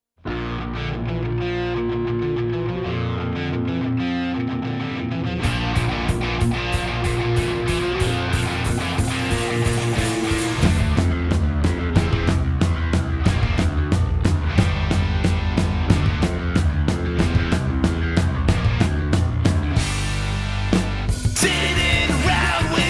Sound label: Vocal w Doubles Mix